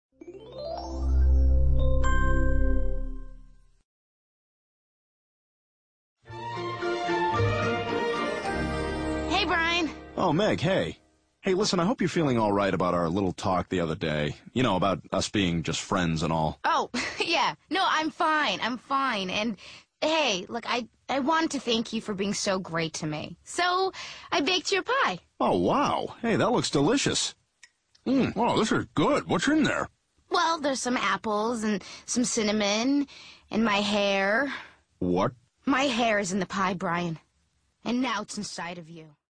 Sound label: whip